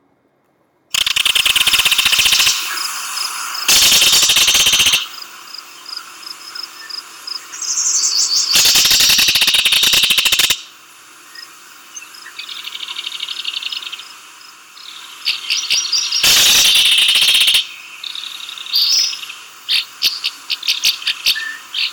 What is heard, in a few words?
belted kingfisher call